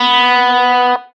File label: electric guitar